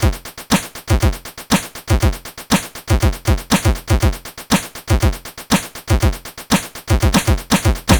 C64 Beat, chiptune drums; Commodore 64

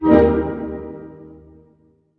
HHIT D# MJ, HARP HITS; Sound On Sound - Peter Siedleczek's Orchestra

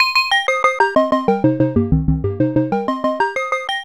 SEQ MONO; AMG - Now CD-ROM (Roland); Old Gold; Old Gold SYN

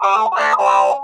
SINUS, Funk Guitar; 90 s Sample CDs; Zero G

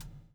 BUBL, BUBBLE; Spectrasonics - Backbeat

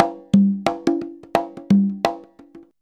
CONGA 089, Percussion Loops; USB Soundscan vol.56 - Modern Percussion Loops; AKAI

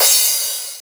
Crash, Drums Cymbal

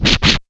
scratch, Yamaha TG-500

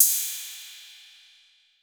808, CYMBAL; CYMBAL P2

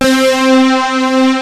ANALOG SAW; Kid Nepro; Elite

